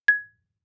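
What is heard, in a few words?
Marimba yarn fortissimo Ab4; MIS Pitches - 2014; Percussion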